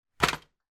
household dyson upright vacuum cleaner put down on floor